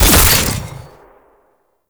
Alt Shot; weapons; Warframe; Fulmin Prime

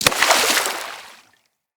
Melee Impact Water

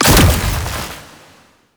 Shield Activate

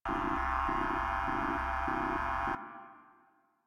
Dog Tag Uncommon Loop; Warframe; Syndicates; Dog Tags

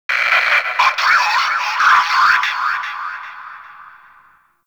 Exterior Notification; Warframe; Grineer; Fortress; Ambience

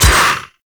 Melee Hit; Voruna

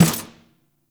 Stadium Snare Destroy; Warframe; Ambience; Nineteen Ninety Nine

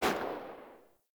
Weapon Justice Rifle Fire Tail; Warframe; Caliber Chicks Minigame; Weapons

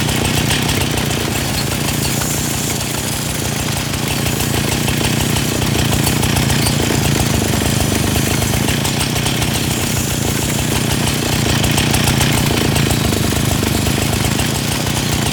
Chain Saw Tonfa Idle Loop; Warframe; Grineer

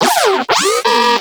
Moa Compassionate Vocal Enemy Spotted; Warframe

